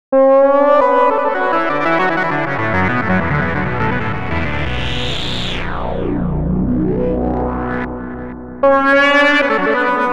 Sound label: final lead; fabfilter twin2